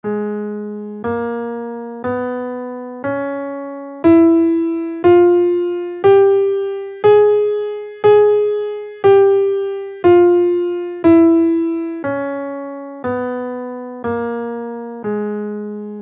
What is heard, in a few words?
jazz minor 5 scale in Gm